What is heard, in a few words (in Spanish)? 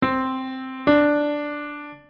tercera disminuida ascendente, intervalos musica; melodia; terceras